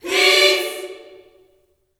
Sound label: peace chord, gospel chord; Vocal Planet Vol1 Gospel